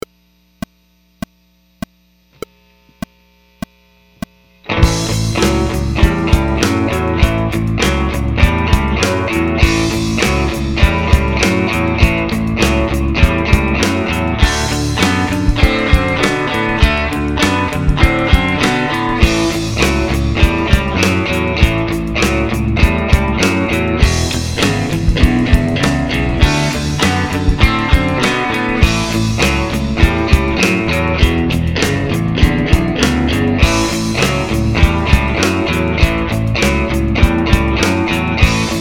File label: 12 Bar Blues in A Rhythm